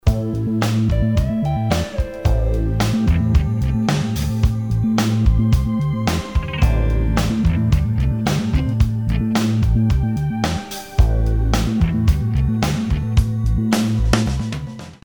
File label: musicbed sample, rock